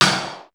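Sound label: pop side, snare; sr16 sd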